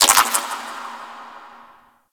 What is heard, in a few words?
Underwater Plant Chatter, Ocean; Warframe; Grineer Ocean